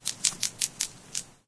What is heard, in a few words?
hopper fly; ambient; Left 4 Dead 2; Source Engine